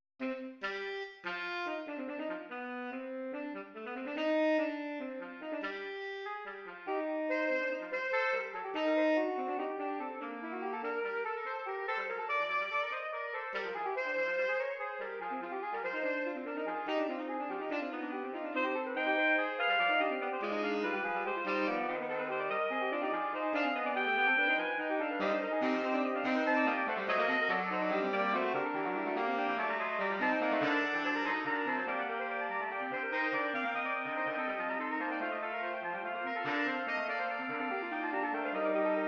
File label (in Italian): Quartetto, MIDI